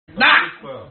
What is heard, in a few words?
Quack